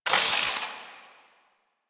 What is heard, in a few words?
Sniper Zoom Out; Kahl Sniper